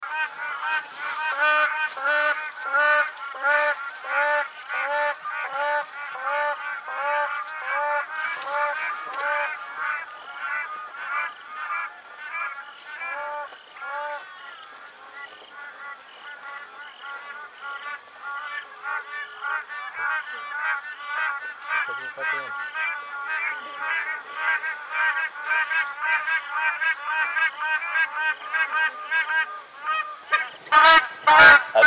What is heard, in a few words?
Crane sound